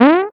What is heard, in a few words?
bounce